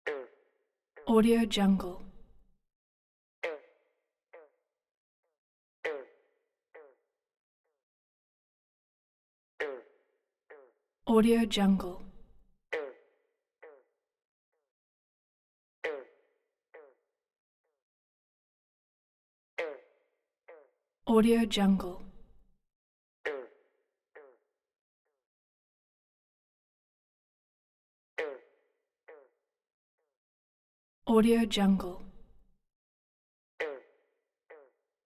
pond frogs; collanderson

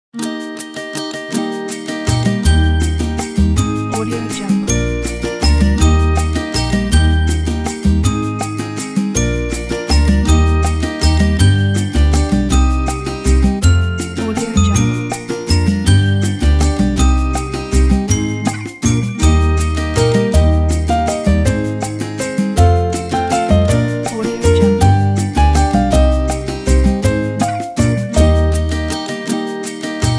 christmas song

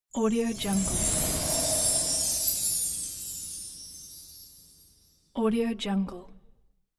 fairy dust

